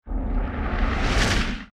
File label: Cannonball Whiz By; Period Combat; Sony Pictures Sound Effects Series